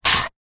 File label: stone small scrape on concrete paving slab; foley